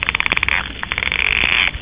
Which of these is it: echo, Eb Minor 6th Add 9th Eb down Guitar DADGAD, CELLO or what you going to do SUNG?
echo